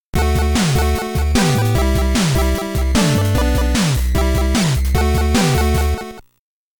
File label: Game Over; NES